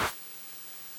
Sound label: PERCUSSION, NES DPCM